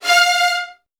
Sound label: VN 16TH, 1F2; ILIO; Kirk Hunter